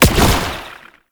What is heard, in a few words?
Proto Infested Brachiolyst Teleport Start; Warframe; Abilities